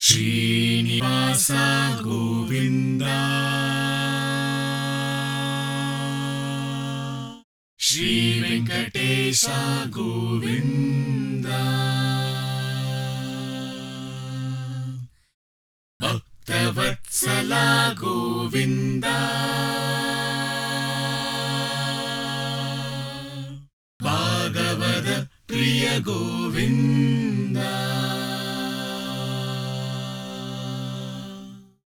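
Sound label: 60 C Choirs Rhythm Chant Ensemble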